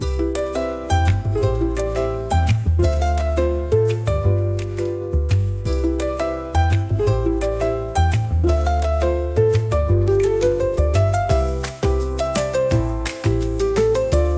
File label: inspirational